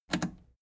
plastic button scratch smooth click enable fast

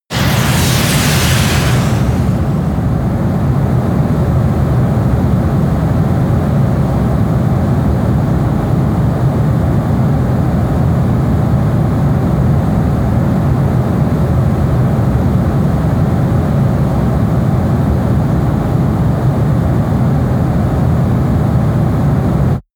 whoosh fly

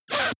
air pressure release

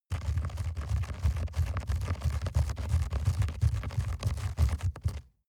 rat run trample fast scrape

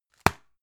tissue package drop hard

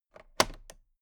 toolbox latch close soft

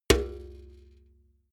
metal bucket hit hard

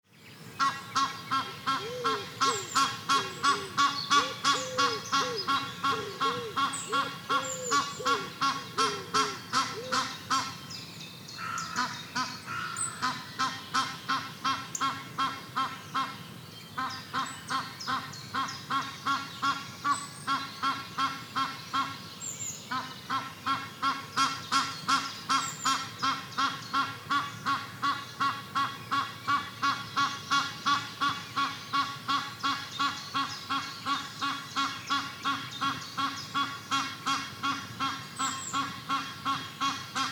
duck meadow atmo light breeze

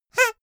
hoot loud short

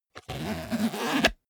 zipper small case close

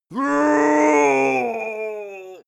male die growl

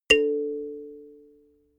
notification kalimba fifth

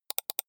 computer mouse left click double soft fast

